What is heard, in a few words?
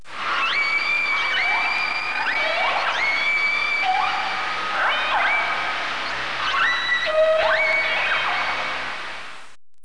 whale